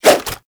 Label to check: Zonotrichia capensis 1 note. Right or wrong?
Wrong